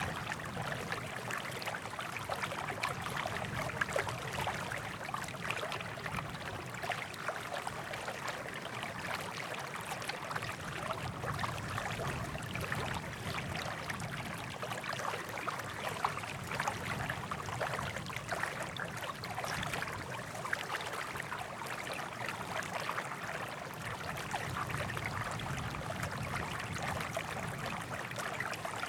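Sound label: Scotland cottage morning; The 39 Steps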